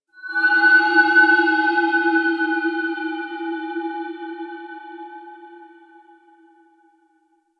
Bell Weep A; Ambience; Interior; Warframe; Orokin

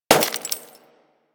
Bullet Impact Debris Glass; Warframe; Weapons

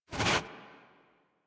Tame Idle Alert Snort; Enemies; Warframe

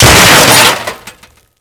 Shipyards Debris Crush; Ambience; Props; Warframe; Grineer Shipyards

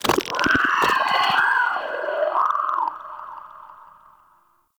Shrimp Glade Vocal Alerted; Creatures; Vocals; Baliq Shrimp Glade

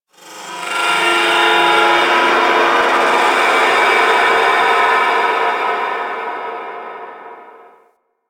Moon Texture Medium, Ambience; Warframe; Orokin

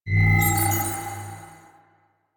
UI Open; Warframe; Quests; The New War; Zariman Class Quiz

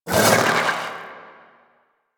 Vocal Pain; Warframe; Sentients; Conculyst

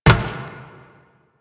Step Metal, footsteps; Warframe; Teshin

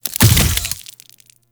Brain Cyst Explosion; Warframe; Infested Eris

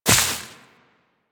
Step Veg, Footsteps Walk; DE; Warframe; Tenno

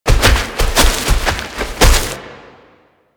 Wolf Run Loop; Animals; Warframe; Quests; Duviri

